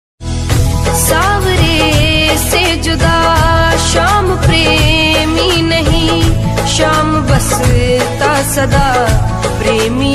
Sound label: bhakti ringtone bhajan ringtone; Shri Krishna